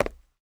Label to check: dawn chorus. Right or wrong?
Wrong